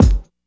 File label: kick drum single shot g key; Duration 0:01; Samplerate 44100 Hz